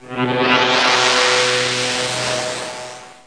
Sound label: rocket; Wonder's Greatest Toyshop on Earth